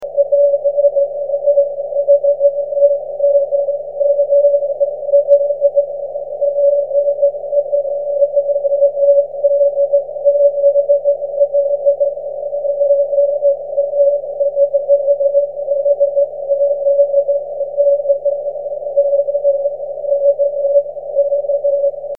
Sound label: 6cm